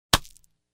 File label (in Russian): zvuk camera